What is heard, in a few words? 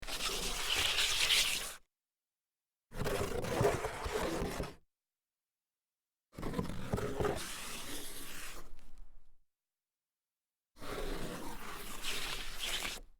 sneaker foot slides solid wood